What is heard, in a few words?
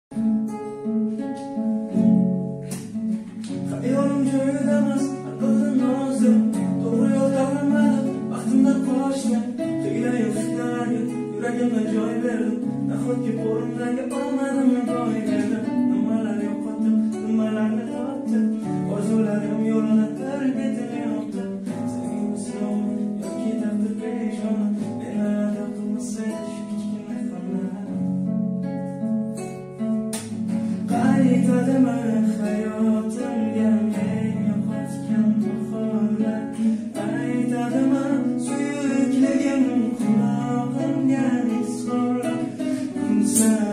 cover gitarada